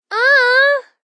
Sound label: Kids Uunh, Female, child, whine; Talking